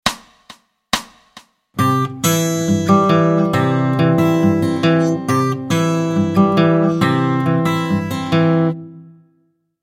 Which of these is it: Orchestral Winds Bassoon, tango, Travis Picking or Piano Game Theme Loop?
Travis Picking